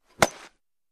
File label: Baseball Bat Alumin; baseball fx & games